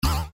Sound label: cascade Land; Bouncy Balls 2